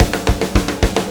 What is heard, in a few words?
PULL, Backbeat; Spectrasonics